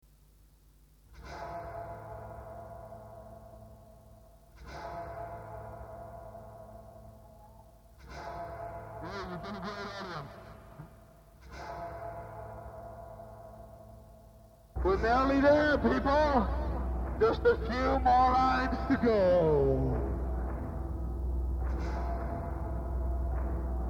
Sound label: noisy ii this is noise